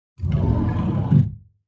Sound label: Air Lock Slide Door